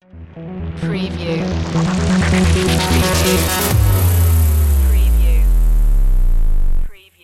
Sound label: crystal rain stinger